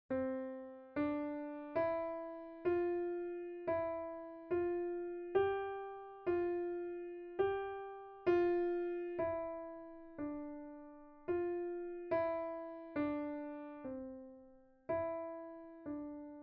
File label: piano